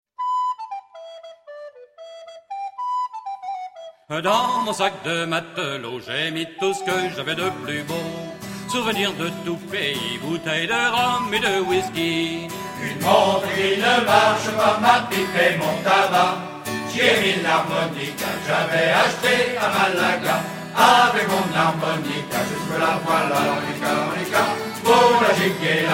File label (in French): Lharmonica